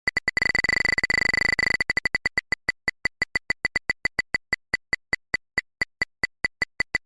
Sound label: glass geiger counter